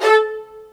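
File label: MARC VLN E3, violin; AKAI S-Series CD-ROM Sound Library VOL-2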